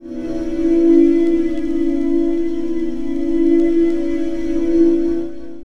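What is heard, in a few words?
Pad Synth; Equipped Music; Loungin House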